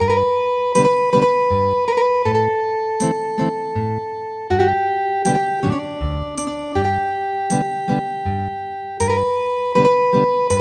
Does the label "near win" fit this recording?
No